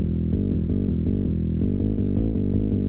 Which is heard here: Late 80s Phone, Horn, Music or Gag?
Music